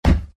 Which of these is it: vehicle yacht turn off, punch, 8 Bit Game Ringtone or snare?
punch